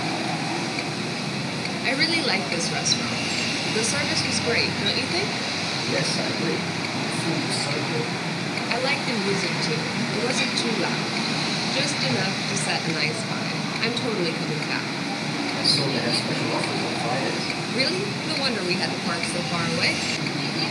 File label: street scene